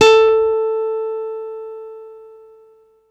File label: guitar steel; Best Service ProSamples vol.55 - Retro Sampler; AKAI